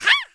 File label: ball red